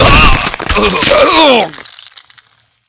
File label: fall death; duke